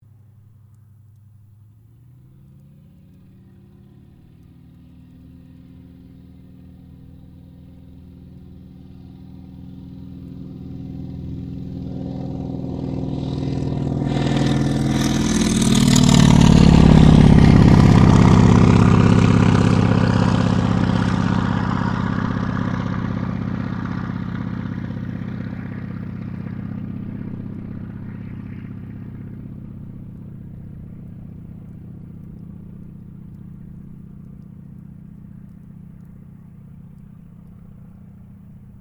AIRCRAFT CAC WIRRAWAY PASS OVERHEAD; CA-16 Wirraway; Airplanes; Vehicles; Airplane Sound Effects

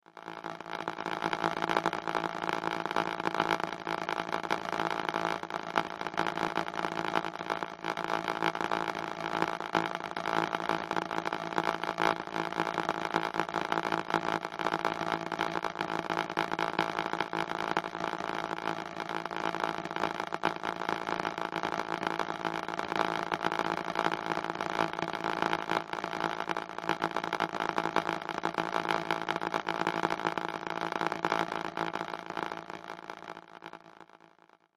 Science Fiction Electric Arcs Constant; Electrical; Sound Design

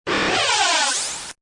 Scifi Production Element Pan Zoom; Scifi Production Element - Pan Zoom. Suitable as science fiction film and game production; Science Fiction Sound Effects; Lynne Music

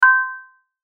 chime bright percussive; Multimedia; Chimes; Blastwave

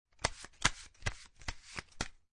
Cards Playing Cards Shuffling; Playing cards, poker, shuffle. Shuffling cards; Casino

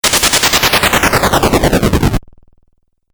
Fireball Video Game

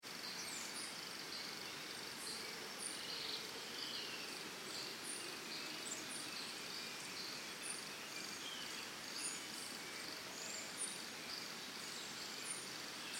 Birds Crickets Costa Rica Early Morning; Backgrounds; Forests And Jungle

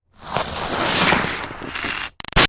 Snow Slide By Icy Scrape Stop; Skiing and Snowboarding; Sports

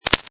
Digital Zap Glitch, Science Fiction Sound Effects; Scifi Production Elements, Set 2

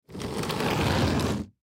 Crate Slide Wood, Crate Slide Box Drag On Cement; Foley; Slides; Objects; Blastwave

